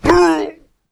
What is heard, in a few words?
Death; SwordAnimsetPro